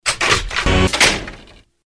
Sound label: rock door